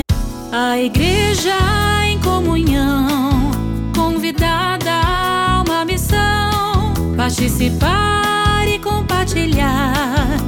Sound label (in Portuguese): Jingle sinodo